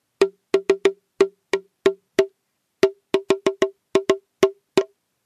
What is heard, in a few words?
taiko; chi-taiko